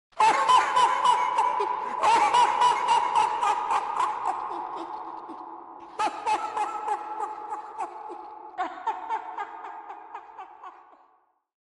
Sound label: Evil Laugh; Elmo